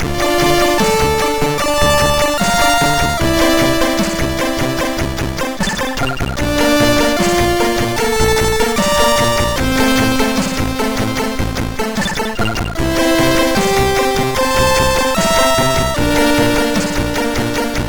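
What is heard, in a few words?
Operation Omega Blast, MOS 6581 SID chip music, games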